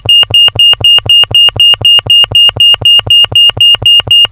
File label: tone